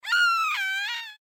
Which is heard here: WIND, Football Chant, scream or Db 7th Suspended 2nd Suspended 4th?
scream